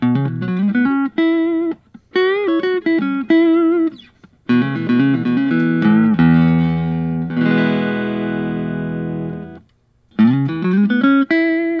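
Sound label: electric guitar; lentz pre lawsuit 60s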